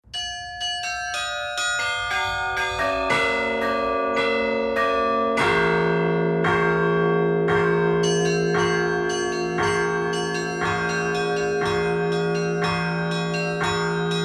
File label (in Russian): Колокольные звоны, Евдокиинский звон; Колокольные звоны Татарстанской митрополии